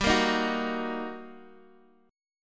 G Minor Major 7th Sharp 5th G, Guitar, Half Step Down